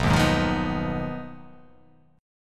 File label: B 6th Flat 5th B down, chord